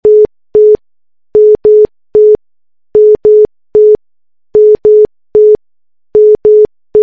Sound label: sitar